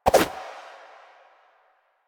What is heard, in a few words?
Run Whoosh, Footsteps; Enemies; Soldiers; Warframe; Lasrian; Butcher